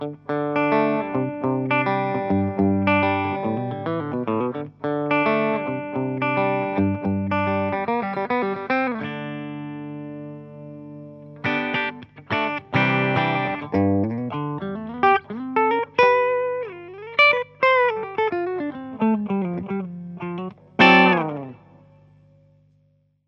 clean 335 talla; dsl401

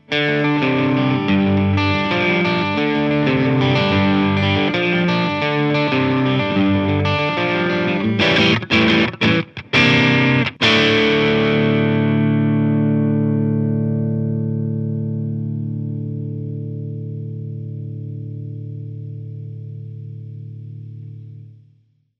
clean strato keski od3 eq; dsl401

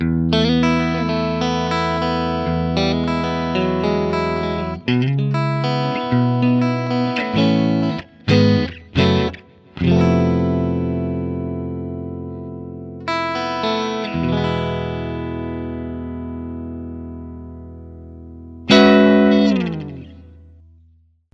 clean strato kaula ja keski; v-twin

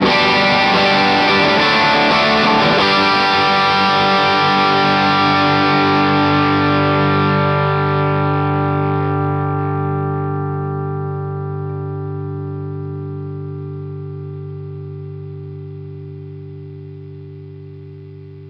sustain täysillä level täysillä, maxon cp101

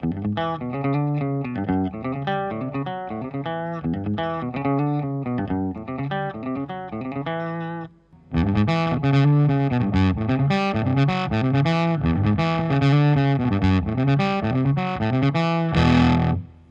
od850 strato talla

